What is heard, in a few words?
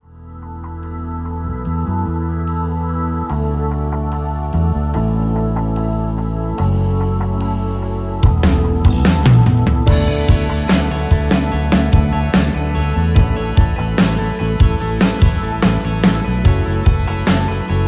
rhythm